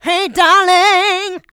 Female Vocals; Houseworx

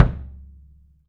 FELT KICK; 3D Sound; 3D Drums

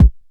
K909, KICKS TR 9+8

